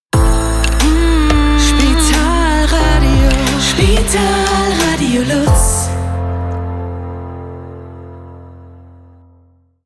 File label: 90 BPM JINGLE LIGHT; Spitalradio LuZ